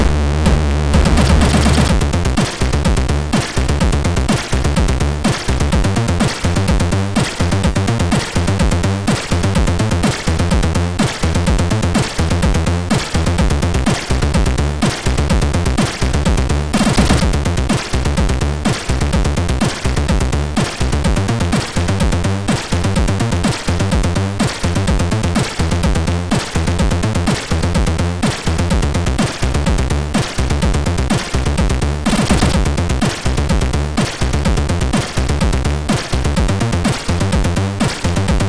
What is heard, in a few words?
Space Radio, MOS6581R2